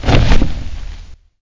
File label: Cloth